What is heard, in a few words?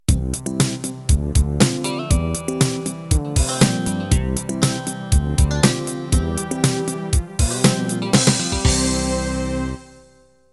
Hip Hop Soul end